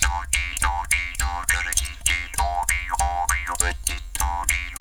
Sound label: JAW GROOVES, Didgeridoo; Big Fish Audio - Didgeridoo and Other Primitive Instruments